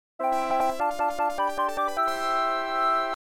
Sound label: Perfect Fanfare; Chiki Chiki Boys; Genesis